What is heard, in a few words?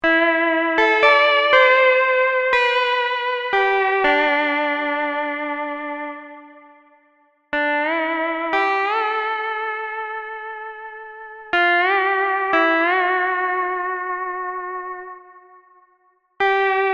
lap steel; lap steel guitar; tiki instruments; chiqui audio